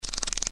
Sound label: spur shot